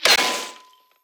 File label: damage hits explosions, Warships